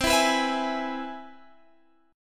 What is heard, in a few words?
C Augmented Major 7th